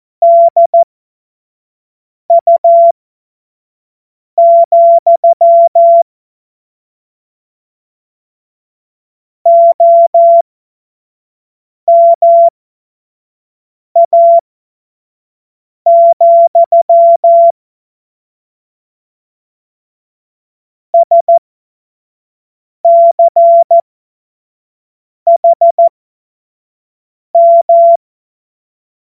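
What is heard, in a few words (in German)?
Witz, cw morsen; Morsekurs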